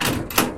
latch unlocked; buttons; source engine; hl1; video games

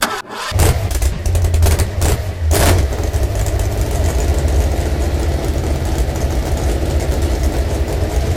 junker start loop, vehicles; video games; source engine; ep2

